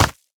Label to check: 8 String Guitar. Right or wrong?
Wrong